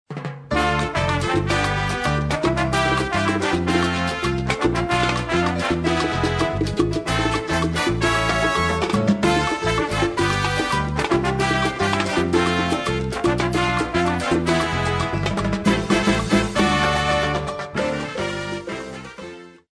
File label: tropical